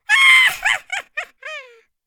Cartoon Laughter

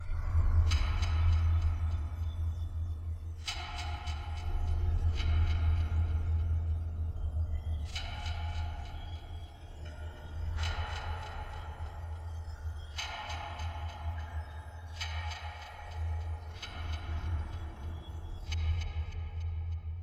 Abyss Abgrund

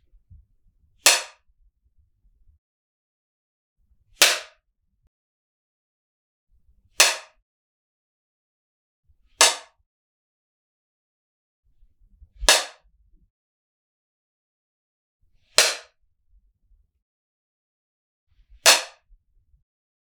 Clapperboard Filmklappe Regieklappe

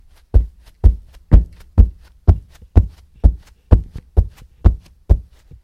Loud Walking On Carpet

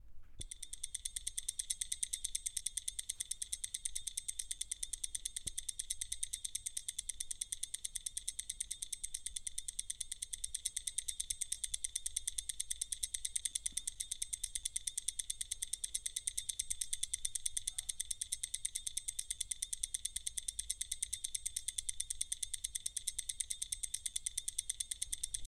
Metallic Stopwatch

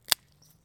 Small Stick Break

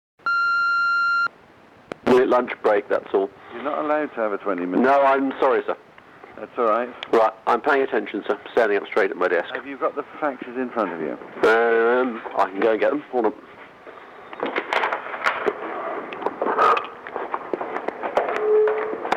Weird Answerphone Feedback

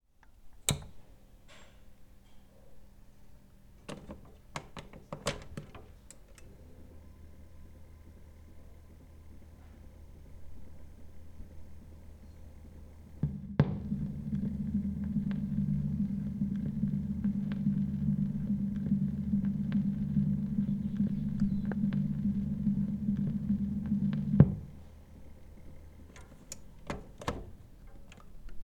Record Player On And Off

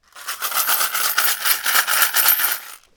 Coins Shaking In Plastic Pot

